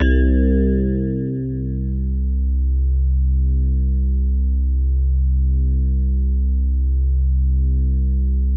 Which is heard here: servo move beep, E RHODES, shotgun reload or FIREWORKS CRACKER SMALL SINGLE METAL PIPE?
E RHODES